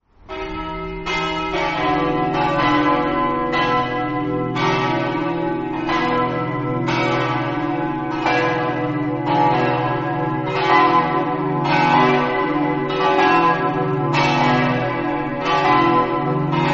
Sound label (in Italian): sedegliano, campane